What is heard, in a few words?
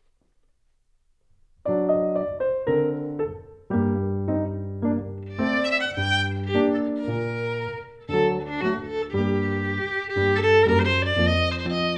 Mazltov, Klezmer Band